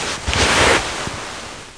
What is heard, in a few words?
plant tube